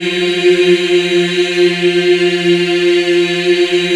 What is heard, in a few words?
F3, Choir Acoustic & Synth; USB Soundscan vol.28; AKAI